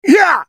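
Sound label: spellcast effort; ZhaoYunXinZhao; Character Voices - English; en US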